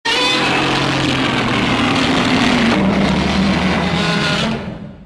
long roar; godzilla 1984

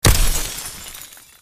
hitting ice

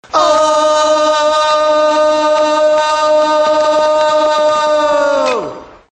oooooh

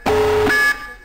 klaxon

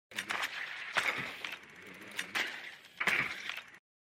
drone jump

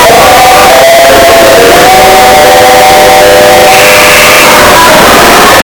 pain foghorn sound

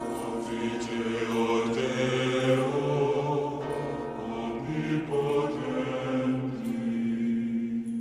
holy church chant; dnd

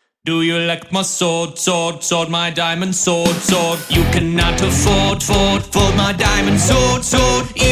minecraft diamond sword song

